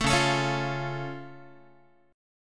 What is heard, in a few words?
Db Augmented, Guitar; strummed